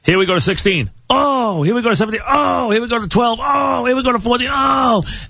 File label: LOUD Noises OHH; WFAN